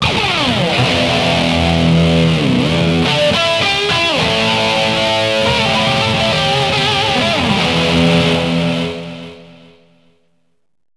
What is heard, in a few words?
guitar electric